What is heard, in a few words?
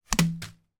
foley paper scrunched up throw hit window